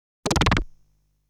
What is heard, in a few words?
Rock Split Sound; legend of hero tonma; Attack Face (Boss 5)